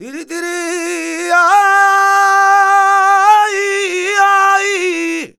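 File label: Flamenco, male cantaor, vocal; Best Service ProSamples vol.46; AKAI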